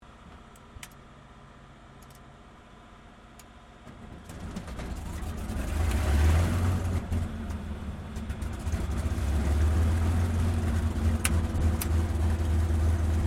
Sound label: AIRCRAFT CAC CA-18 MUSTANG MK23 STARTUP GENERATOR ASSISTED INTERIOR STEREO, WW2 Mustang fighter plane